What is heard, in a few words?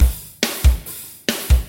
metal